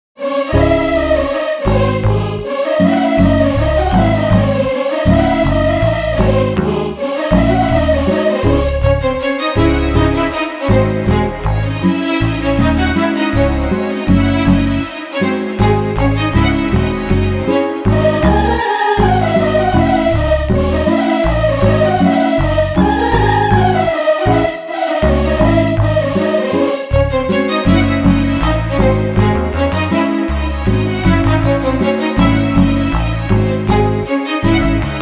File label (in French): Mazurka